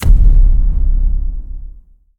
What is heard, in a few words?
IMPACT SWEETENER Large